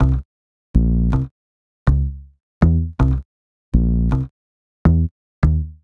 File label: Sneaky Bass, Drum N Bass; Sonic Foundry (Sony Creative Software); Knutrix Drum & Bass